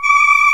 CHOIR E4; AKAI S-Series CD-ROM Sound Library VOL-2

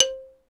MLT XYLO

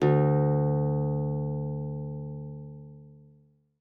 D2, guitar; Xsample VOL-2

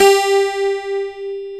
G-1 ON, MINI 12.GTR; AKAI S-Series CD-ROM Sound Library VOL-7

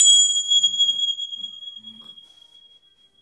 BELL; AKAI S-Series CD-ROM Sound Library VOL-8

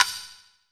FX GILO S; AKAI S-Series CD-ROM Sound Library VOL-5; FX PERC

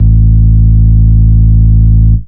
bass sub; Ueberschall; Techno Trance Essentials

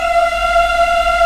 VOX African; Roland LCDP11 Africa VOL-1

